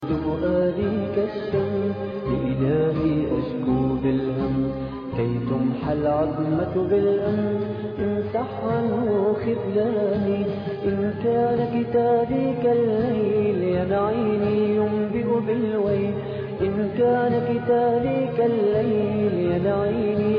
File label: islamic ringtone; islamic ringtones